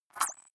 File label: click domino effect, signale